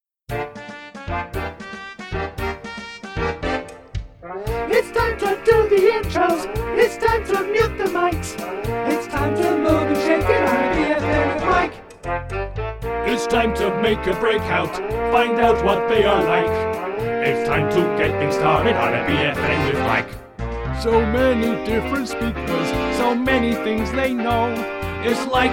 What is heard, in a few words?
Vocals; Swindon